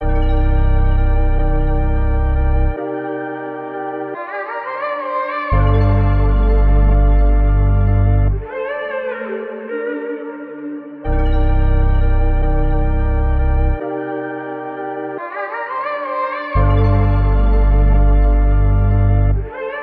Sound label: No Way Home - 174 BPM G Min, Melody; RnB; Cymatics; Cymatics - 2022 Melody Collection - RnB Pt 2